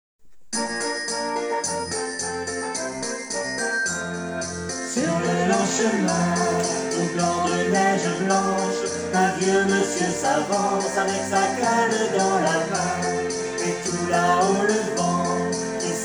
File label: Jingle bells